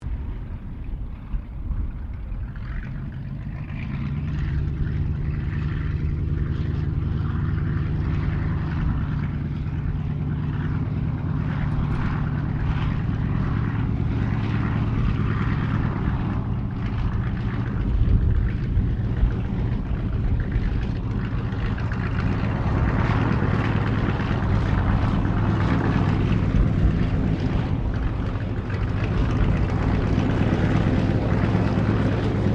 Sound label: Aircraft 1916 Sopwith Pup replica taxi, WW1; Replica of a WW1 Sopwith Pup; Airplane; Airplanes; Vehicles